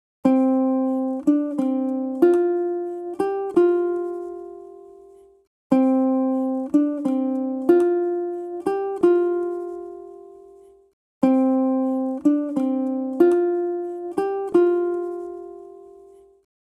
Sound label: Arirang melody motive